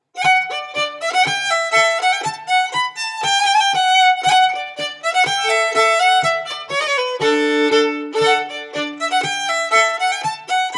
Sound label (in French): Bourree; Ms Aurillac